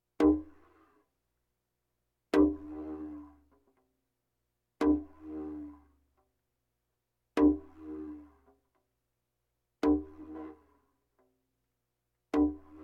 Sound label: Percussion Bongos Tympani rolls Gongs Drums